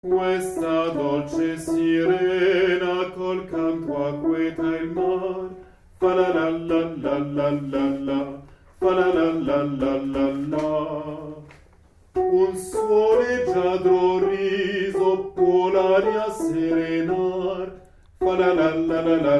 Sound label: SIRENA Alti